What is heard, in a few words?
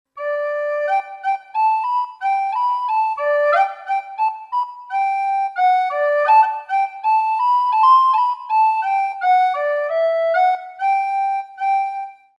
Recorder App flute; Artinoise